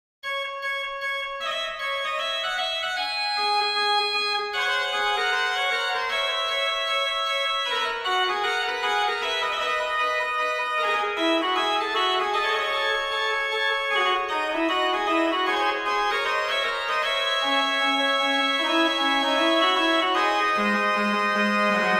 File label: Magnificat Basse de Trompette, organ; roquemaure; Dandrieu; Magnificat 1,4